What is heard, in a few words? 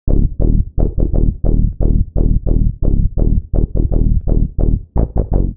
Eb Minor 86 bpm, Bassline; MIDI Clips; Tonal; Freak-o-saur; Ableton; Factory Packs; Chop and Swing